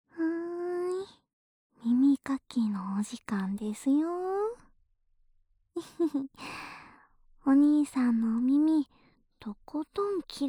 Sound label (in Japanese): 耳かき ループ 左